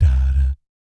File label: BS DADAT, Vocal Planet Jazz & FX; ILIO; JAZZCOMBO